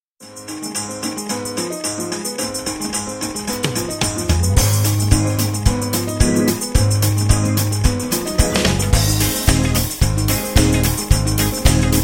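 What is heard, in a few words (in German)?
Glory Hallelujah Playback ohne Bläser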